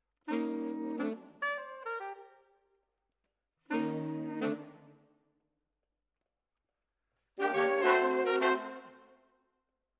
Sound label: Sax